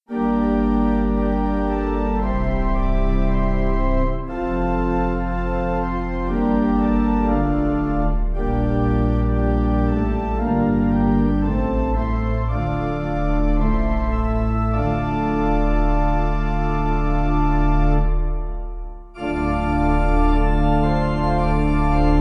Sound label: Pipe